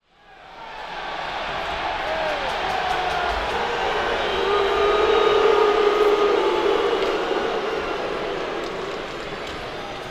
CROWD, hockey, stadium, rowdy, frustrated, booing referee; Bonson; Hockey 360